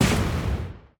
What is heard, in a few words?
kill layer